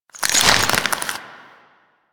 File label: Crystal Growths Attach; Warframe; Citrine